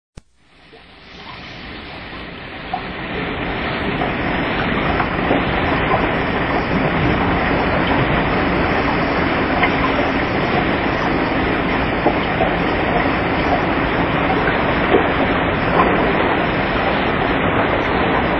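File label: storm noise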